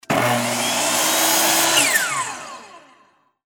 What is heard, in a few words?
electric fret saw